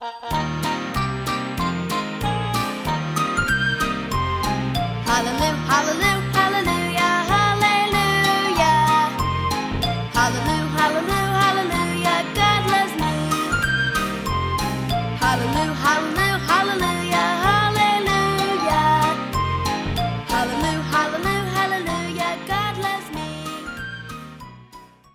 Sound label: Sing Along Hallelujah